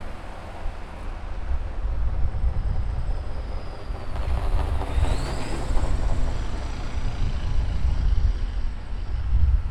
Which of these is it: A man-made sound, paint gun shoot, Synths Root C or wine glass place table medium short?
A man-made sound